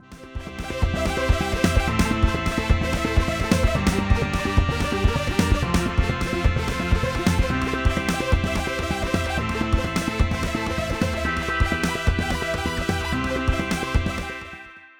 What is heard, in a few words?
EDM 8 Bar Song; Reason